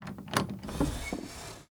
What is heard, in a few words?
Open Window